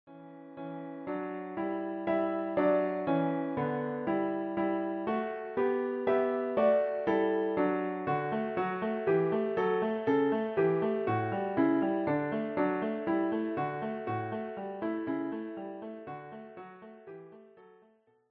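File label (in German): Freude schöner Götterfunken, Klavier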